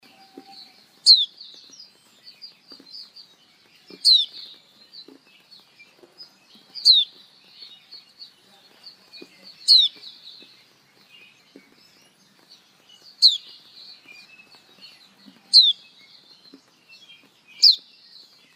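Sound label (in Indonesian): pleci betina, Indonesian bird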